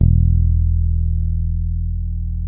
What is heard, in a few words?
bass, sustain; Sampleheads; Will Lee Bass Library